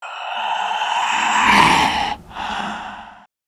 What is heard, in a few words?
female zombie sighted; nmrih; zerosiki; slender